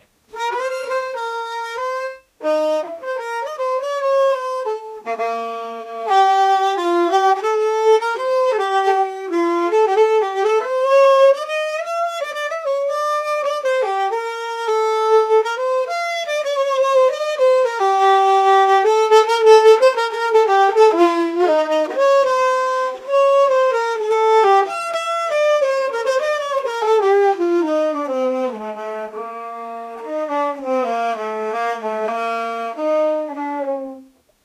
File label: arabic sounds of mandolin